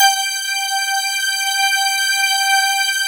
PERCP, PERC PAD; Sound Gallery - Charged

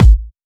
Dubstep Kicks

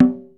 md conga, Sequential Circuits Studio-440

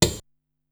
Piano Hit, Korg Wavestation-SR Single Hits

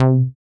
short bass; Dance; BASS SOUNDS; Masterbits; Dance Trax

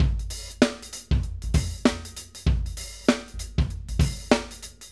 Acoustic Live Drum

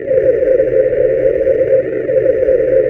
DRUM CHOIR, PADS FX

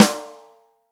ROCK SNARE CHROMATIC, Real Drum Kits

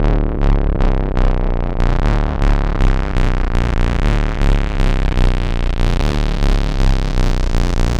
C64 Bass, Commodore 64, Chiptune

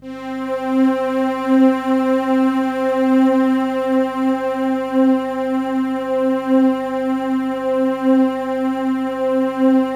PAD C4; USB Soundscan vol.05 - Explosive Jungle; AKAI

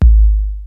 808 KIT; Rubber Chicken Software Sample Bank

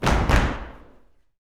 YOUTH FEET, SLAP STOMP; Spectrasonics; Vocal Planet; Blues Soul

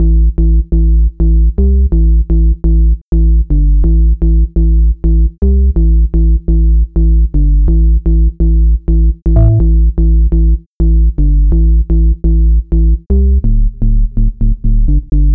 BSE 125 E, BASS LOOPS, House; Best Service ProSamples vol.10 - House; AKAI

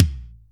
Kick, Akai MPC500; Toolkits

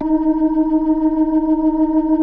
EB4F, B3

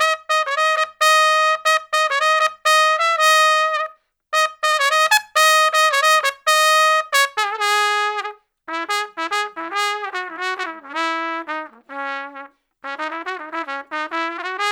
Trumpet Solo, Jazz Traditional

